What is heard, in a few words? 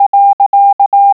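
PERIOD, morse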